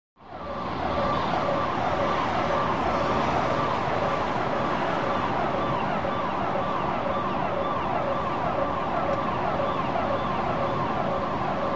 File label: Alarm Distant Perspe; alarms